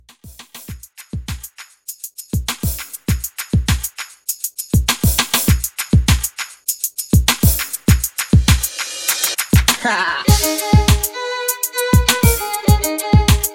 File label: RB Redrum 100 Bpm